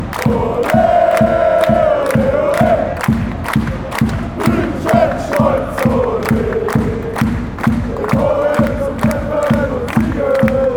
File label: ole ole ole, fanchants